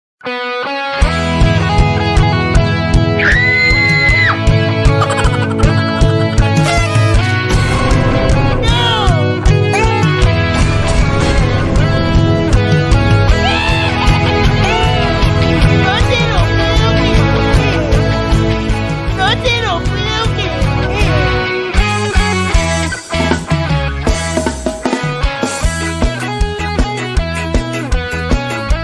programas de tv

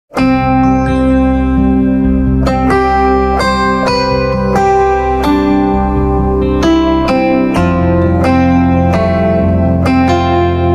Samsung Galaxy Note, tono de llamada

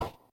Msg Whatsapp Prrut

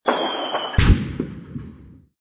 explosion zumbidos

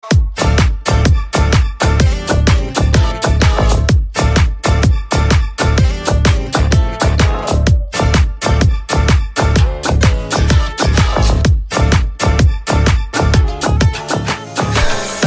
corrido espacial

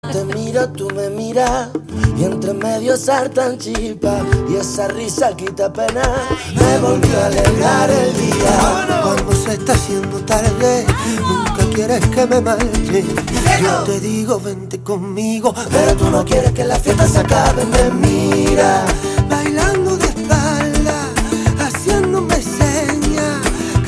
fondo flamenco

